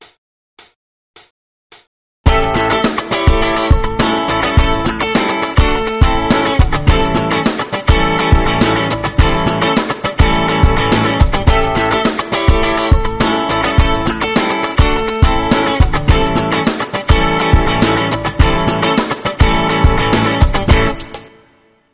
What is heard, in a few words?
funk